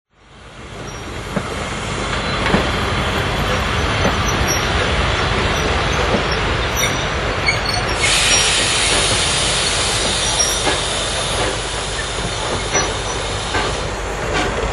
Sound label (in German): Dampflok